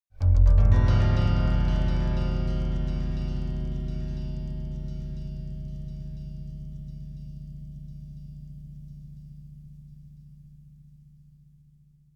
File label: Arpeggiated Broken Chord - Pitched Piano Musical; Hollywood Edge; sound designer's toolkit v2